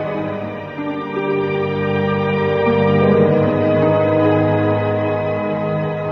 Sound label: strings creeps and crawlies; Loops; Sad